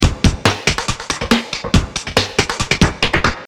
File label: Space Break